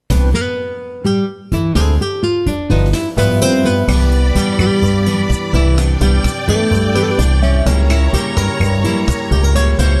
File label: BGM Bosanova; Magic Sudoku Numpla Oekaki Puzzle; psp